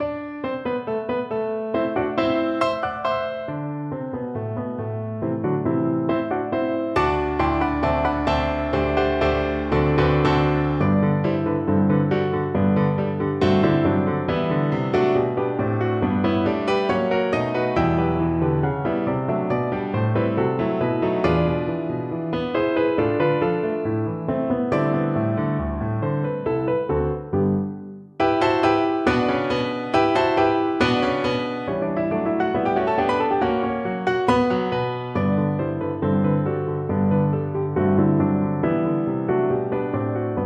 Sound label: Miniatures Allegretto; Bridge; Miniatures 3